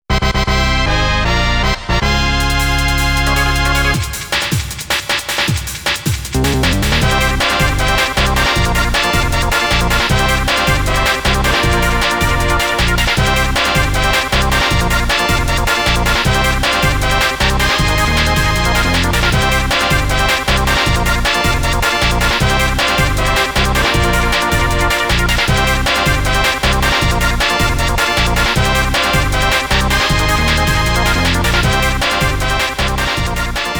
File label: Winner's Jig; Chocobo Racing